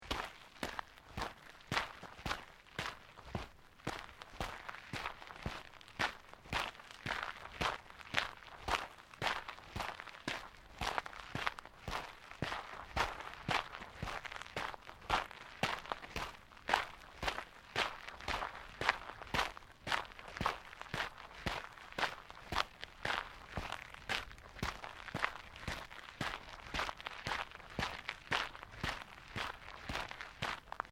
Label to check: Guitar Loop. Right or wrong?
Wrong